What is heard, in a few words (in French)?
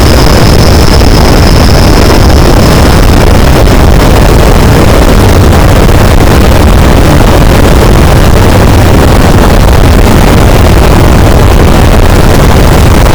cachalot negatif, boat, Physeter Macrocephalus